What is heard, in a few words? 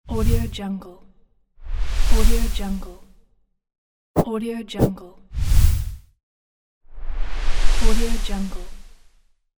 swoosh whoosh